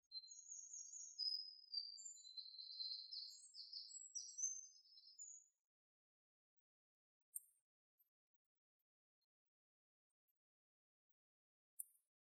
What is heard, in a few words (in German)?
Lichtung Soundaufnahme Botanischer Garten Marburg